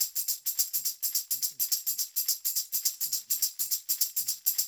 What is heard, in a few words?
percussion loop; InVision Interactive; Percussion Slam; SAHARA